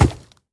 猪灵蛮兵脚步声

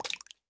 青蛙伸舌声

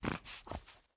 狐狸待机声

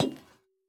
铜灯踩踏声

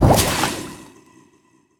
尸壳转化声

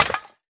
饰纹陶罐碎裂声

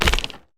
嘎枝摇摆声

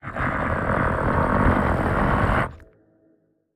愤怒声; 监守者

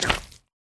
蜂蜜块踩踏声

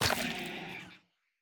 幽匿破坏声

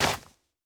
灵魂土踩踏声

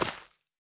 杜鹃破坏声

